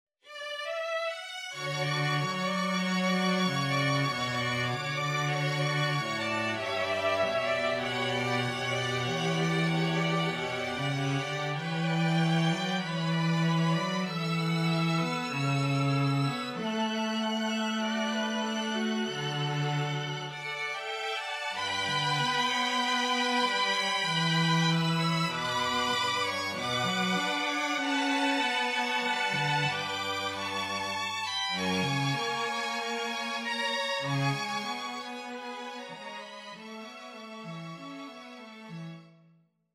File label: STRING QUARTET